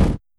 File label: backboard, basketball